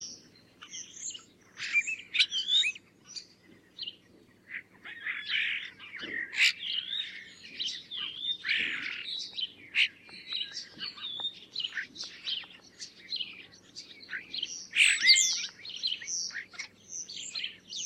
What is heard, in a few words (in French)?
etourneau sansonnet